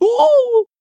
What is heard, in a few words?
monster click